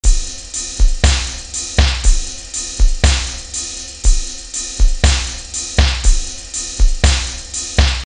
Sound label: loop